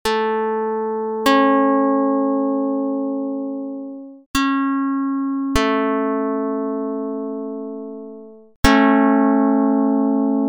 pluck dr220; Jid 56/45

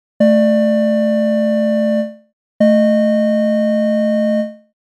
Comparison 23edo elevenths

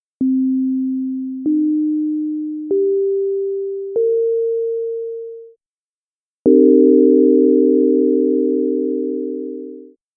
Swiss tetrad

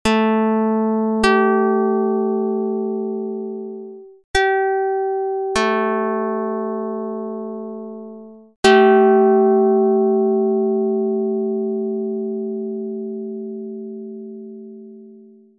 pluck; dr220

